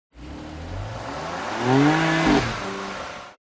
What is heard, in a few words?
TRANSPORTATION CAR BMW M4 Rev High RPM